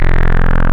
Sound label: Res Squares, wavetables; Animoog